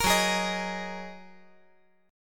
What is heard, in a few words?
Gb 6th Add 9th Gb down